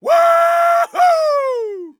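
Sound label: voice exclaim swing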